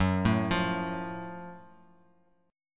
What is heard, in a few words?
F sharp Minor Major 7th F sharp Bass Standard; arpeggiated